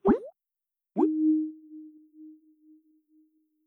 on hold; Microsoft Office; LYNC

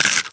chocolate; Candy Crush Friends

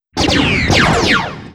Void; Fly For Insanity

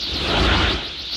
skill bow range increase; FlyForInsanity

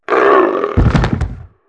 Dumb Bull die; FlyForInsanity

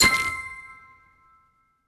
match sequence sfx; Candy Crush Friends

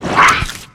Dmg; Trillipy; Fly For Insanity

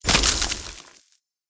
ice destroyed; Candy Crush Friends

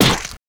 Animal; FlyForInsanity

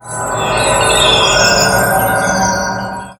Skill quick step; FlyForInsanity

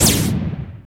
Cross line; Fly For Insanity

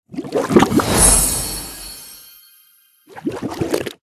win screen jam; Candy Crush Friends

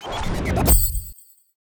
Critical swing; Pc Skill; FlyForInsanity

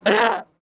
Big Raccoon Dmg; FlyForInsanity

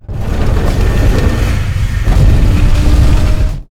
Clockworks Walk; FlyForInsanity

